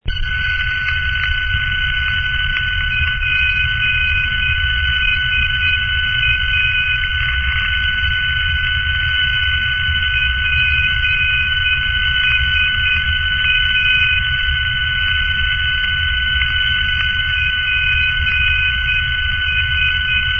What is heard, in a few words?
ndb cw; europe